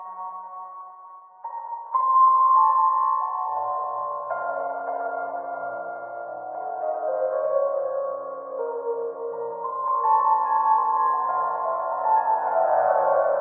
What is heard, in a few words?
Music big ambience; Hospital